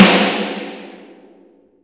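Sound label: SN, snare; Best Service; Real Mega Drums VOL-1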